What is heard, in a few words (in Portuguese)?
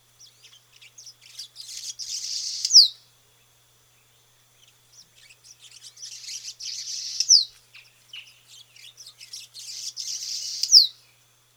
andorinha pequena, andorinha pequena de casa